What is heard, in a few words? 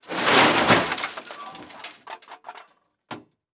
Crash Car With Glass Debris; Crashes